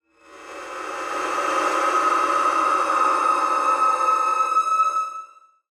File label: Screamy Screetchy; soundscrate